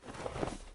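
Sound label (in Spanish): SOTANA, cassock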